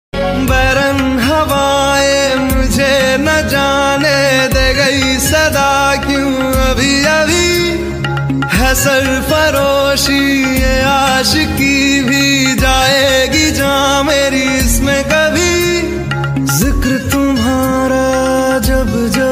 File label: Broken Heart Song Ringtone